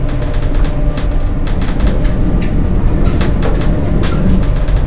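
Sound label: elevator loop; lift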